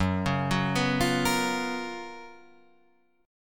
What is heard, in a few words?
F sharp Major 11th